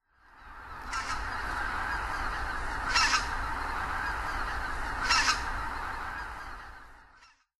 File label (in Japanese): magan, miyajimanuma, koe; マガン; 北海道宮島沼